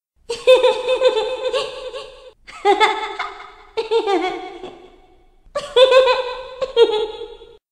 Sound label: kid laugh scary